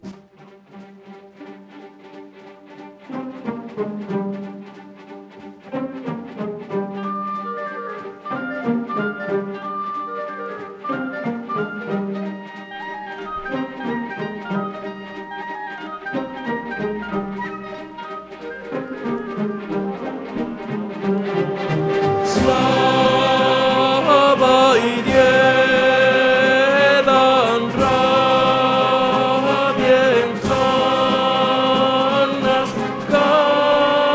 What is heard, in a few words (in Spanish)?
Danza TENORES; REPERTORIO ÓPERA